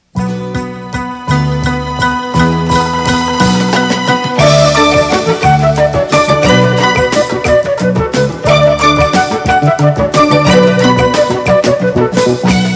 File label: Instrumental